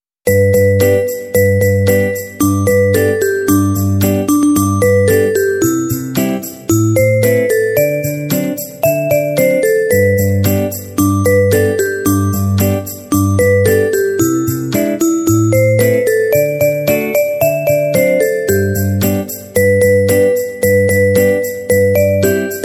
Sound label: Jingle Bells